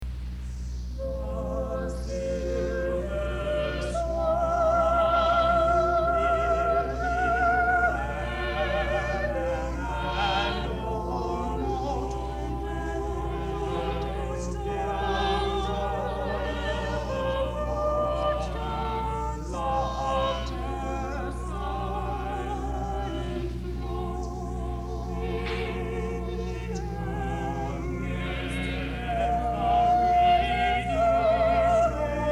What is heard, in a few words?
Singers; Renaissance; The Silver Swan